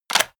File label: medieval menu light open